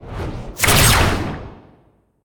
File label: Hero Deal Damage; arcane